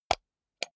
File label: computer mouse wheel click slow hard